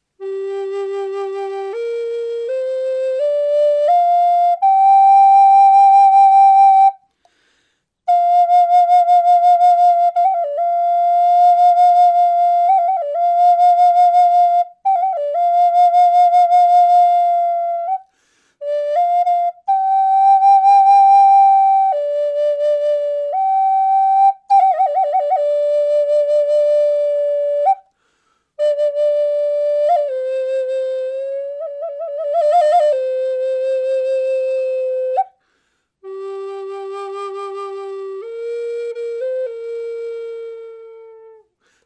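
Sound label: natural, flute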